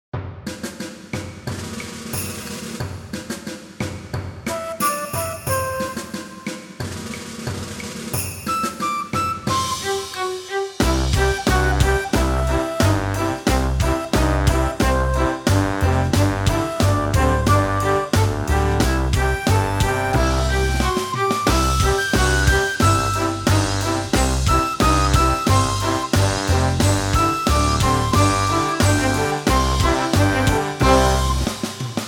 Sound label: karaoke